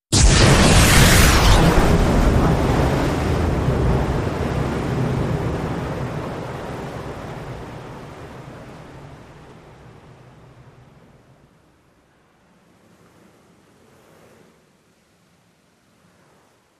Explo Surface POV, depth charge

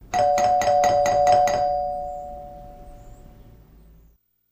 Doorbell Multi Ring

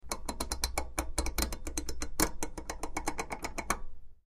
Metal Dial Large Meta, switch clicks

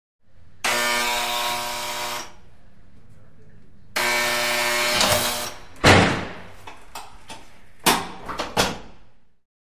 Apartment Door Buzzer, doorbells

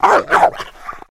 Rottweiler Vicious

